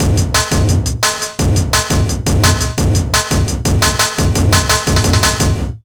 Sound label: 172 break, Jungle; USB Soundscan vol.05; Explosive Jungle; AKAI; 90s sample CD